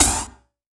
Yamaha CS1x Drums

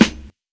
KIT, Dance Mega Drums, HIP; Best Service

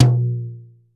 KOMMERZDRUMS

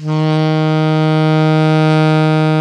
Eb low, alto sax; Giga Samples Collection; Brass

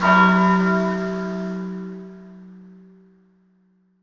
ONE BELL, Cathedral Organ; ILIO; Propeller Islands

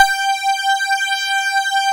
JUNO POLY SY, Juno Synths; E-MU Formula 4000 Series Vol. 3; Analog Odyssey

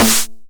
909 SD Tape Sat

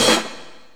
HH, HIHAT

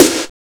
PSYKO SNARE; Drums In Your FACE!

